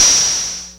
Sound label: Clapbox Noise; Ableton Foundation FreePacks